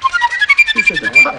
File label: flute sample